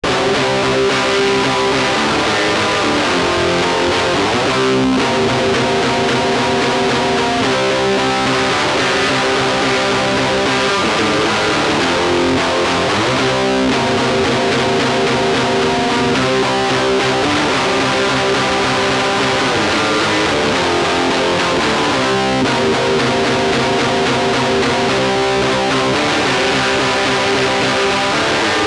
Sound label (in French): guitare